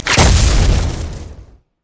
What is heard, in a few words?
spider attack; LoL